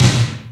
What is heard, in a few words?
SD, SNARES; Crypton - DrumBase5000